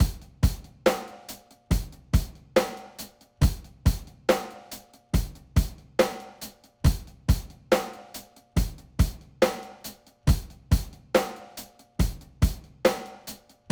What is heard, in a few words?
rock